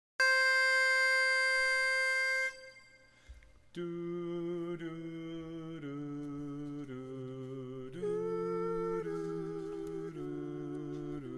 Lead

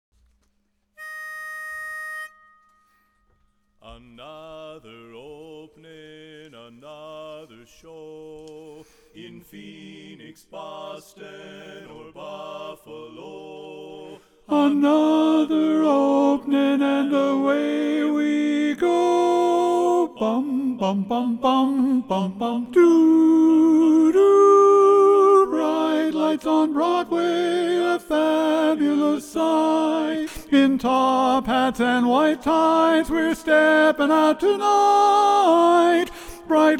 Tenor

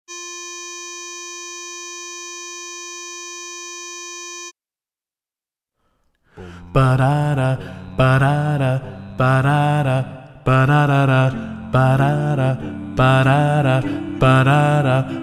Baritone Predominant

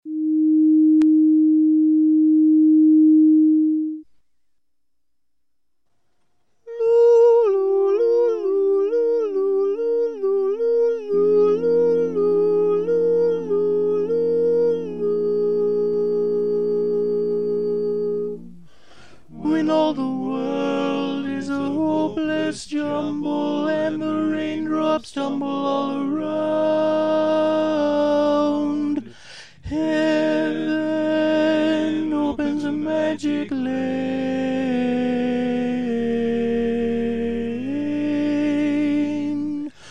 Rainbow Tenor

